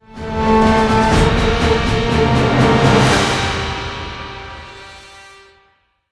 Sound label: round win